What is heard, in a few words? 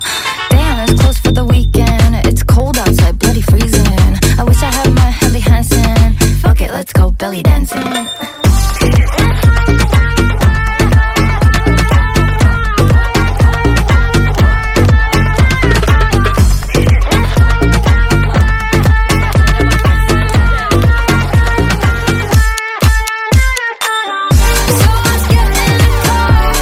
bellydancing ringtone